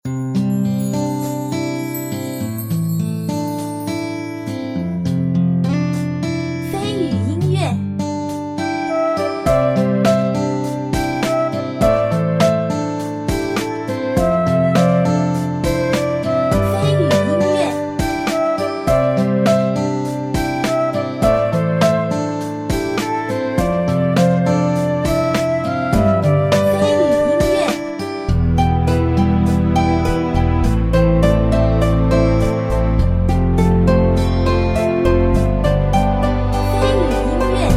抒情 优美 浪漫

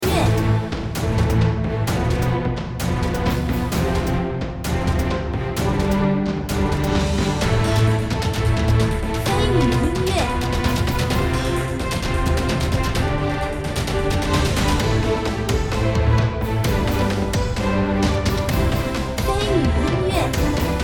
关卡BOSS战

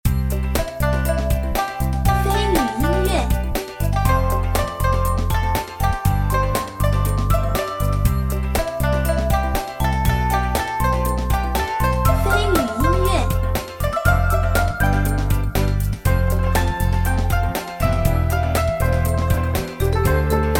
登录音乐

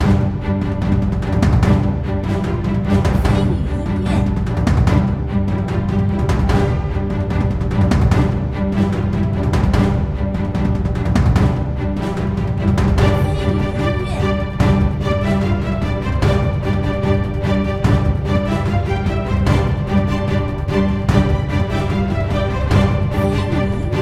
boss-激烈

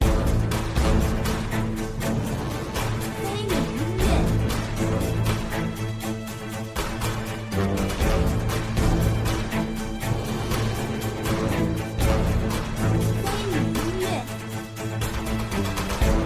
野外

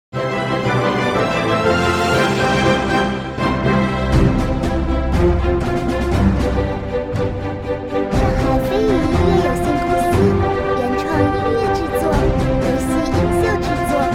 宏伟-管弦-日系

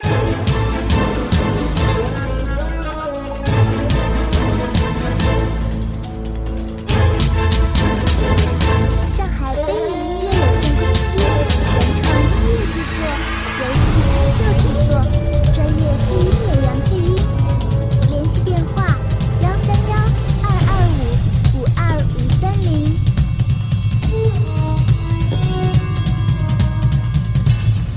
危险紧张; 远古之地

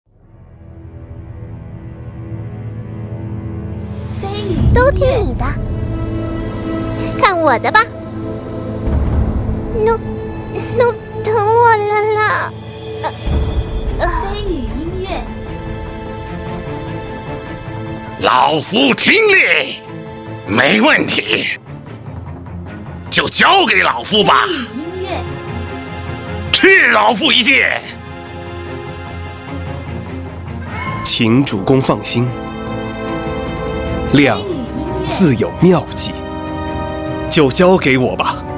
激烈 磅礴

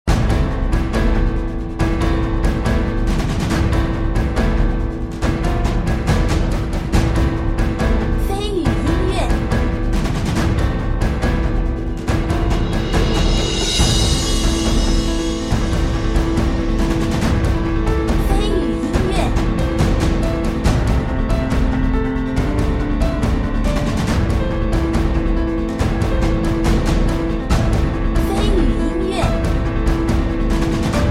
战斗 悲伤 忧虑

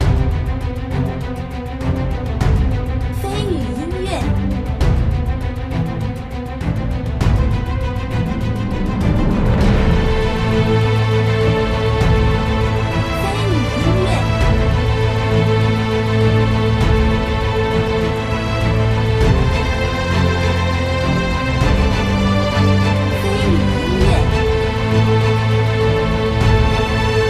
登录

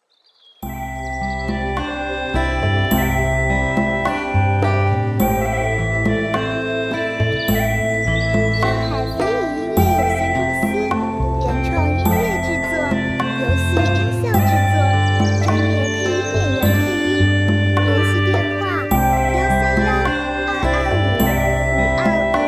舒缓明亮; 五毒教

